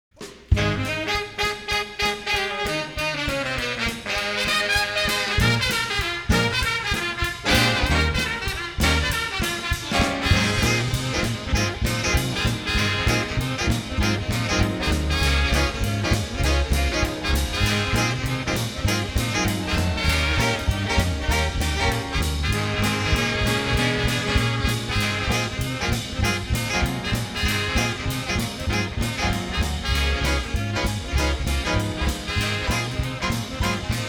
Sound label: Swing